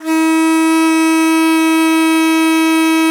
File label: BON DI, Winds, Old World Instruments; E-MU Producer Series Vol. 7 – Old World Instruments